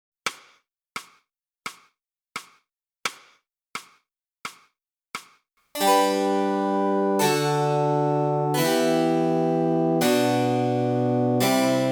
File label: flute